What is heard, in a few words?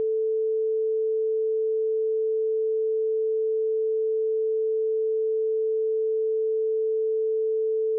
tone; ladybird; LibMedia